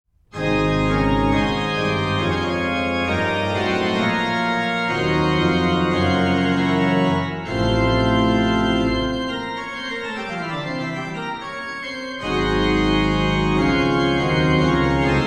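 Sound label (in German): Allein Gott in der Höh sei Ehr, Bach Organ Works Chorale Preludes; BWV 715; Individual Chorale Preludes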